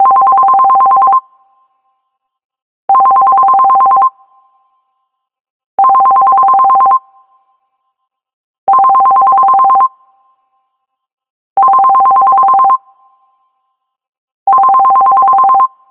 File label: Simple Bell; LG; G-Flex